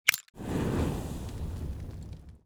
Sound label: fire idle loop, molotov; weapons; Counter Strike Model Pack; CS2